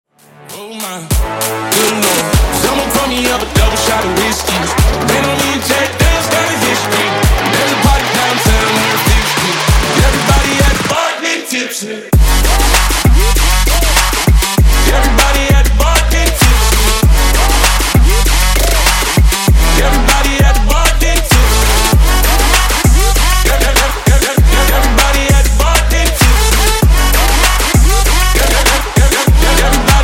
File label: 98Bpm